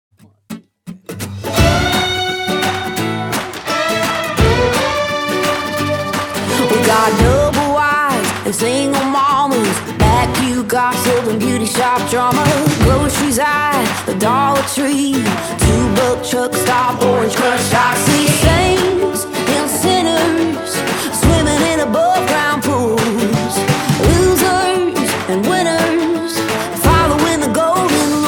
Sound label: Southern Gospel